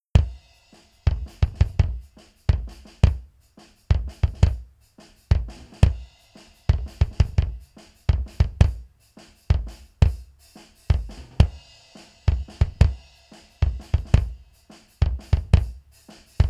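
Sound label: bass drum, drums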